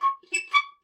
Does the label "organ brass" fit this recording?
No